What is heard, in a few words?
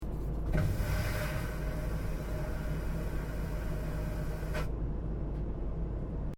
AIRCRAFT BOEING 767-300ER CRUISING HEIGHT INTERIOR TOILET FLUSH; Airplanes (Sound Effects:Vehicles)